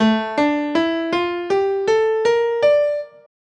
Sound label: hankdrum hokkaido tuning